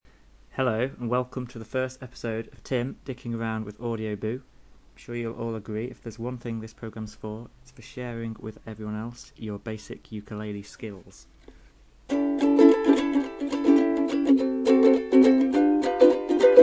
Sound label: ukulele; fun time